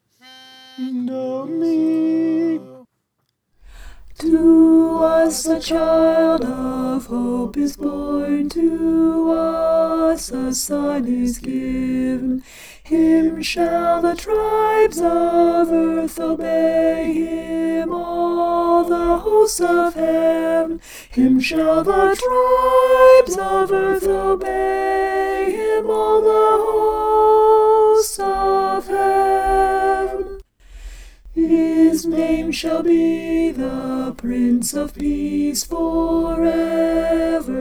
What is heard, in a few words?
alto